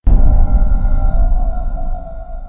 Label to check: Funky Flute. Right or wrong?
Wrong